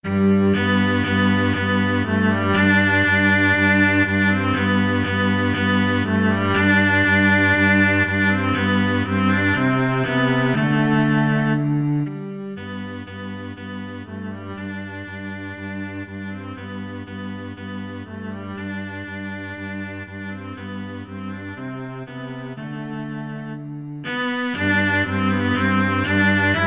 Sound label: cello duet